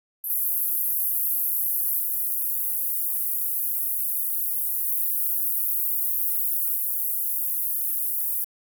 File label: 12.5 kHz, 16 kHz (1/3 oct), -20 dB, L, 16 kHz (1/1 oct), -16 dB, R; Denon Jazz Sampler Plus The Audio Tool Box II